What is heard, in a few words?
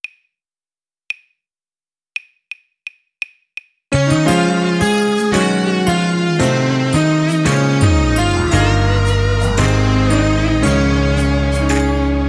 drumless